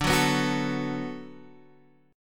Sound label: D 6th D, chord